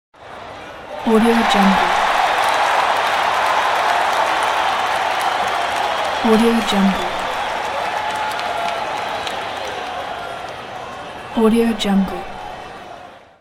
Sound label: jubilant fans